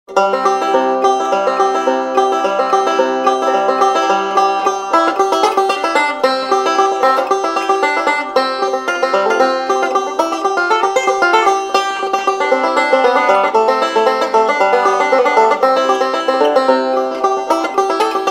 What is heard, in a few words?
Banjo Solo; gamerip